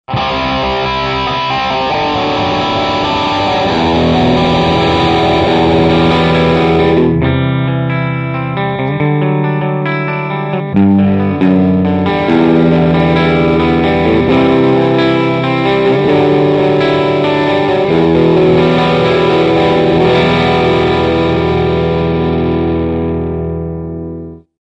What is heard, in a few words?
Higain Clntube; GNX1